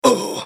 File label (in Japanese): 被ダメージ; クラウドボネ